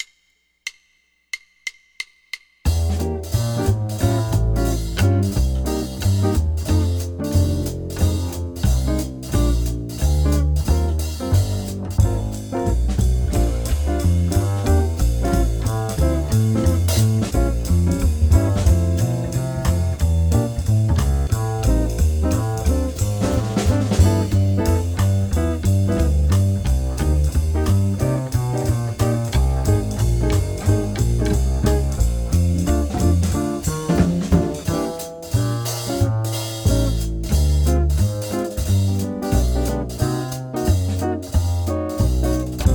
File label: swing piano play along, jazz; LMEA All-State Jazz